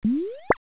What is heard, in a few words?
enter